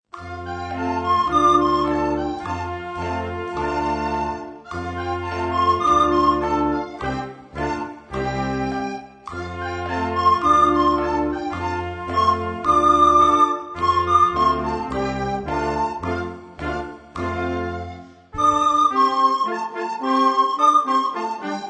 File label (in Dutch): blokfluit; Chinese Puppet Theatre